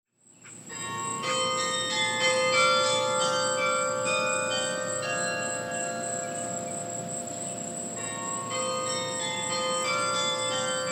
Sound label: Morning Mist, carillon; Range: 3.5 Octaves; Publisher: GCNA